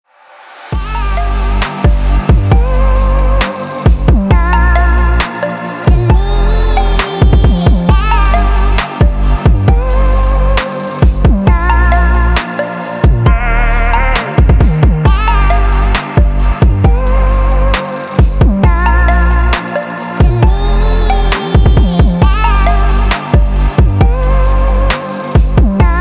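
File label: modern ringtones for phone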